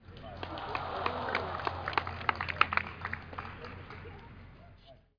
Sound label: crowd double bogey